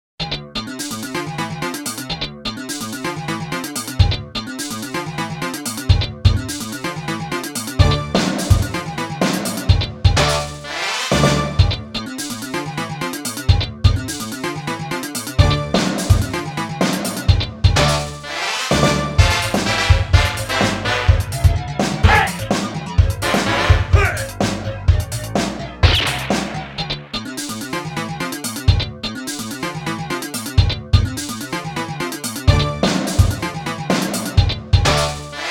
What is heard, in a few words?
get funk